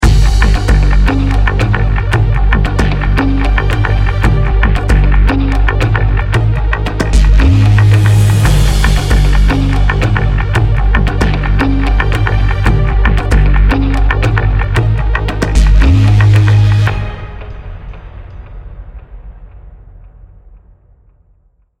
choose team, music; Counter-Strike Global Offensive

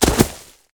body fall; Mount&Blade Warband

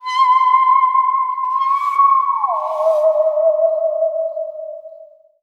fairground; mob; Left 4 Dead 2

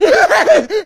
jockey lurk; voice; idle; Left 4 Dead 2

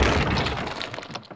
Trebuchet Stop; Sid Meier's Civilization 4 Complete; Warlords; Units

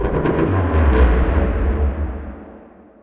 strider distant; Garry's Mod; hl2; streetwar

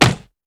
wooden hit high armor low damage; Mount&Blade Warband

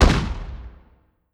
infantry fire standing

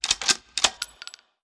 infantry rifle cartridge

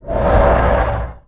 projector move; Emperor - Battle for Dune

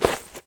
jump begin; Mount&Blade Warband

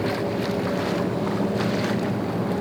ship idle transport route; Hearts of Iron IV Death or Dishonor

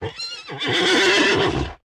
horse whinny; Mount&Blade Warband

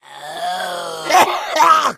smoker alert, voice; Left 4 Dead 2

